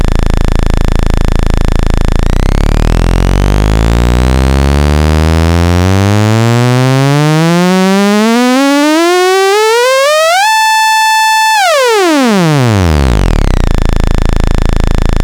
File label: SID saw